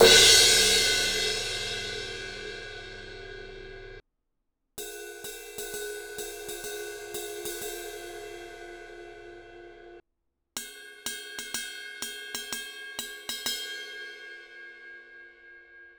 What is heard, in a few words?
18 inch crash ride bell, cymbal; hhx evolution; Sabian